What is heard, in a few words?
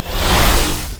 power transfer return; LoL; viktor